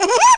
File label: baby laugh; baby bowser